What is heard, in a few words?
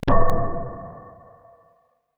PERC MIX; Best Service ProSamples vol.55 - Retro Sampler; AKAI